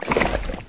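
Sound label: water short close muffled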